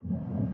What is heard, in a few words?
push start